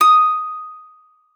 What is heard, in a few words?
PSAL D#6, psalter; Xsample VOL-8